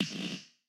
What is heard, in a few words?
Music; Sifu